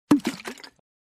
Water Plop In Water, Water Plop, Single; Small Object Drop; Small Object Dropped Into Water.